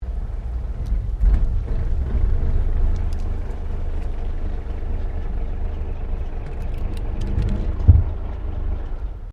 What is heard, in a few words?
CAR HOLDEN FJ SLOW MANEAUVERING, Classic Australian cars; 1955; Cars (Sound Effects:Vehicles)